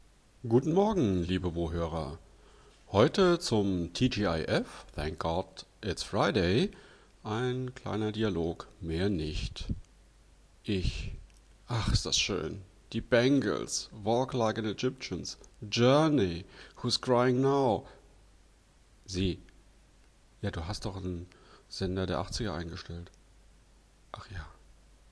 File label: music of the 80s